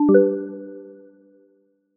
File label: success; insiteful; sonic branding ux